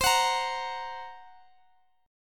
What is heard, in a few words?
F sharp Major Flat 5th